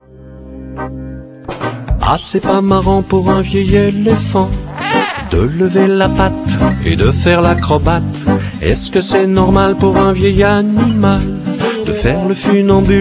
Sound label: reggae